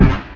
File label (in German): aufsetz